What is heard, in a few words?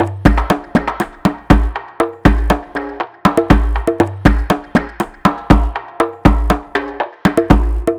Conga Loop, Ethnic Instruments; Sonic Foundry (Sony Creative Software); The Electronic Music Manuscript Collection